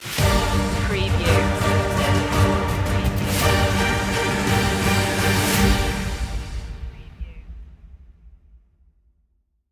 battle music

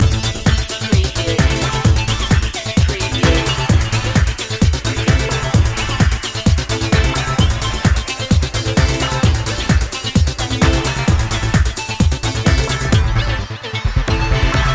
thrillseeker loop; dance; trance-techno

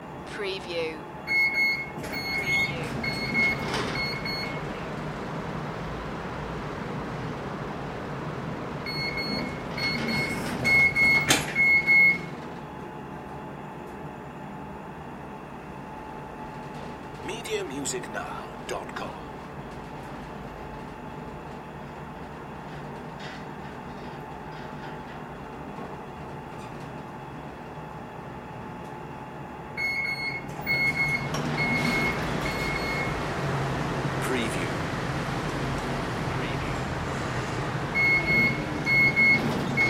elevator ride loop; transport; the sound pack tree

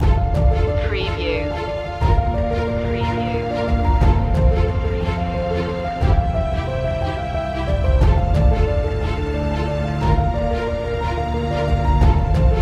epic emotional cinematic loop, trailers

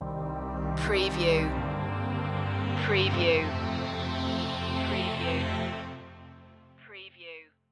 natural impulse stinger; relaxation-meditation

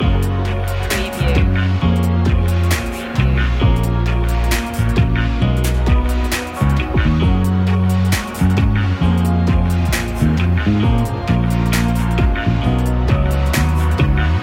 blissful moments loop; ambient; chillout

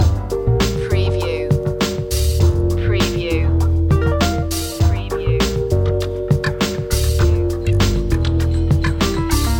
loop, electric piano

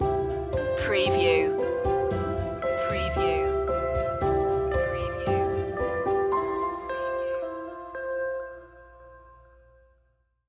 stinger; love emotional

